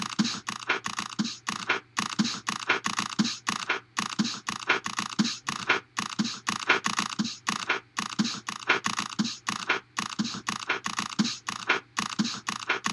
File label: infinite drum